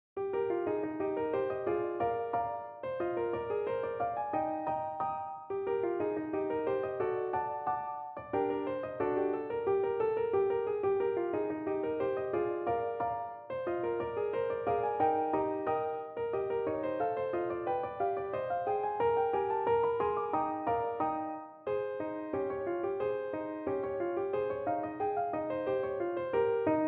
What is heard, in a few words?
pizz